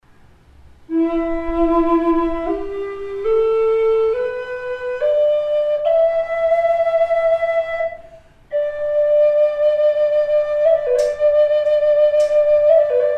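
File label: reverb, flute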